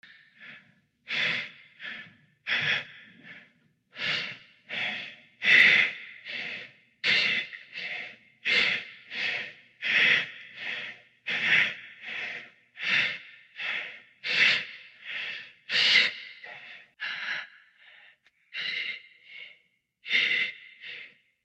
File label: atmo dark breaths